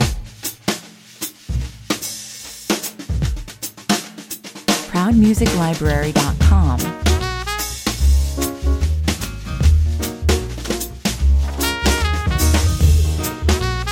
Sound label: jazz club 150 bpm, Swings and swings and swings and swings